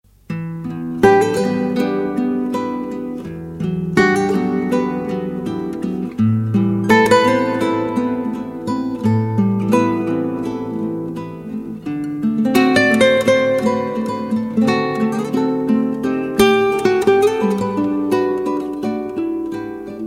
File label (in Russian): Романтическая Музыка Гитары